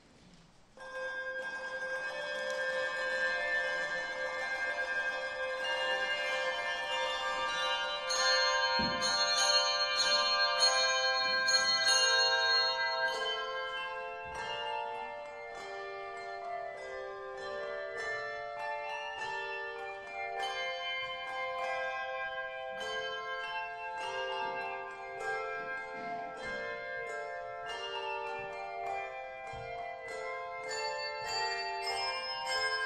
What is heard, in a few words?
Prelude Handbells; Savior's Soundwaves